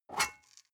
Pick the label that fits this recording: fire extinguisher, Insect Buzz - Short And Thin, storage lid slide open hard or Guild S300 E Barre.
storage lid slide open hard